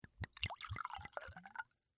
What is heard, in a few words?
Whiskey Pour, Foley, Whiskey, Pour, Into, Shot, Glass; Drink Mixing; BlastWave